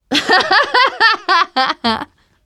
laughter, teen girl

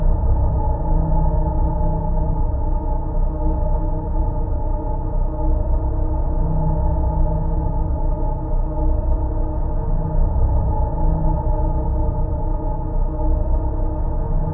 drone; Left 4 Dead 2; ambient

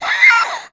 rage, infected, female; npc, action

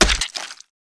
body medium impact soft; physics

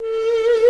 saw danger, music, zombat

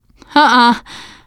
negative noise, teengirl; player; survivor; voice

